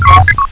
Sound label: turr spot, weapons